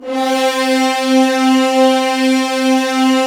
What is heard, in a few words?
Horns; Roland - LA Composer vol. 4